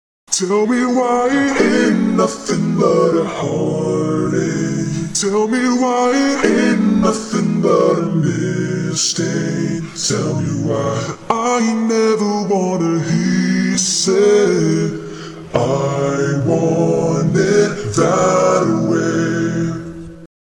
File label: acapella